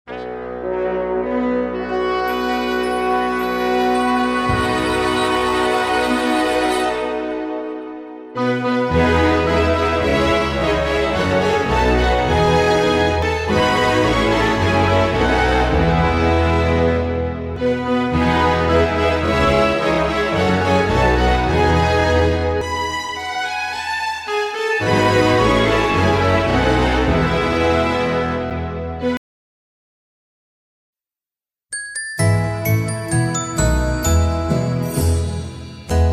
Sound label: Twelve Days of Christmas ACCOMP no sfx